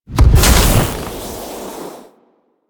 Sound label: Heat Beam Fire; Enemies; Abilities; Warframe; Corpus; Arachnoid Camper Terra